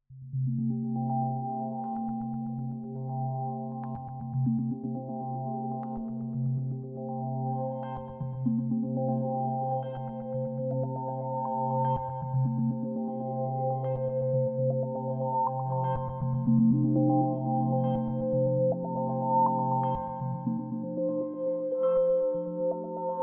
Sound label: pads